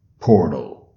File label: Portal; WoWInterface; WeakAuras